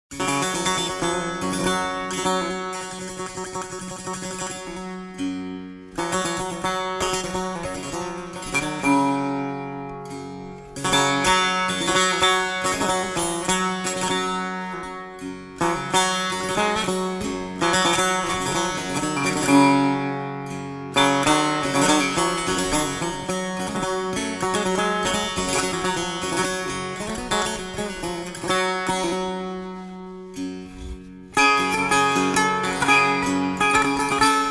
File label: Instrumental